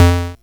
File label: Kick, Hing-Hon EK001